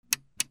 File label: CLICKS